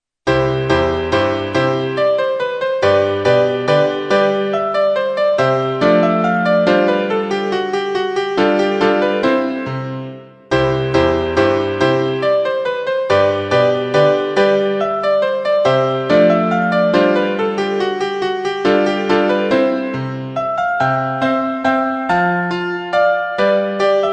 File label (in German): Deutscher Walzer; Haydn